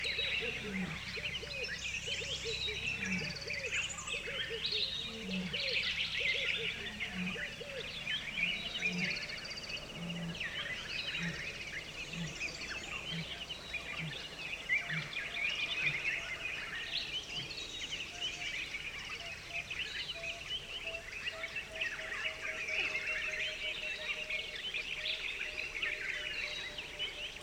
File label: Dawn, Safari; Tembe; Natal Post Safari